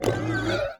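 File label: enemy Mud Lung Death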